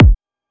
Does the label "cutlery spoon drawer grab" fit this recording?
No